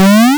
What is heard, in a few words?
jump